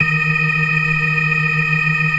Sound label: FAST VIB, TREMELO+VIB, Synth and Organ